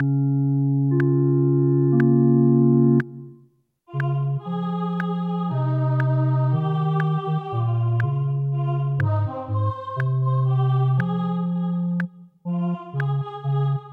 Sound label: O clap your hands; Gibbons